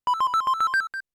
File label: Chime Save; rockfish-2011